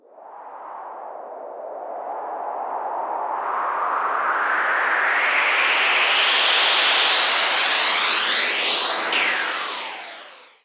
Sweeps Up, Effects

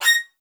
A#6, LOUD VIOLINS; Q Up Arts - Denny Jaeger Master Violin Library

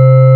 VIBES C3; Big Fish Audio; Synth City

